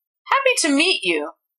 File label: happy; セピオの教材音声〜母音〜